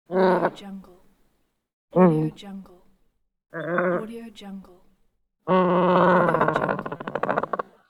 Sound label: penguin call